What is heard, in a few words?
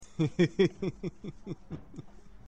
Hearty Laugh